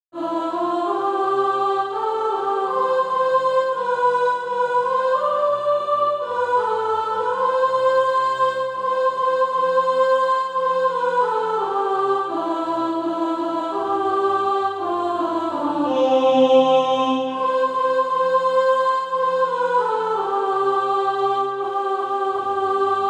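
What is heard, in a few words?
soprano, SATB Practise Music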